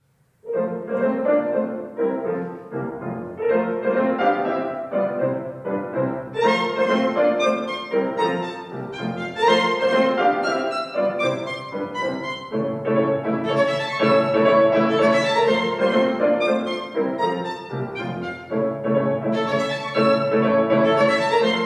Sonata for Violin and Piano No. 5 in F Major Scherzo Allegro; Beethoven; Spring; Op. 24; III.; Allegro molto